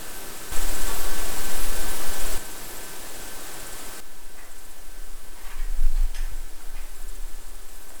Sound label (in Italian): Presunto richiamo campana caricamento arma; Forte Belvedere